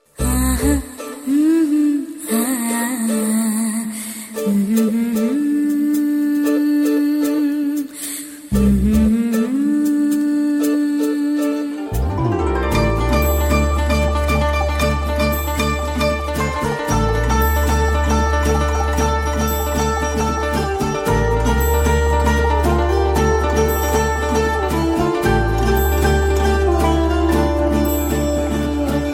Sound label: Ringtone, Tamil; Ayan; 2009 Tamil Hit Ringtones